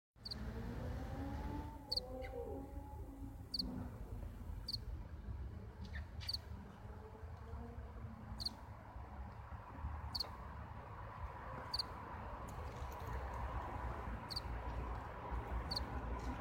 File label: Acheta domesticus; Radzikowski Pawel